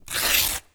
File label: Pop Quiz paper tear